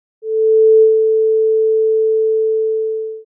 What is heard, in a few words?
bip